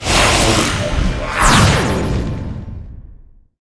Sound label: arch lich attack, monster